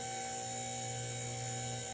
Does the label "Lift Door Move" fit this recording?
Yes